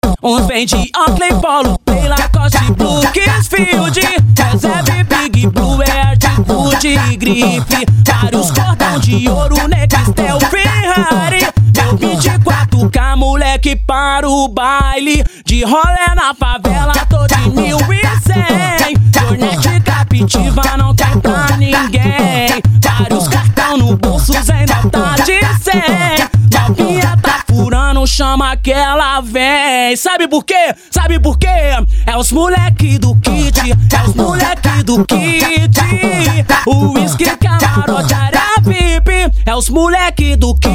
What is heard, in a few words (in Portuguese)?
funk